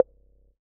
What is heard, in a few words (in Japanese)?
ポン コミカル